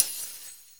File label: breaking glass - IDFT(abs(DFT(sample))) post-processed by SRFO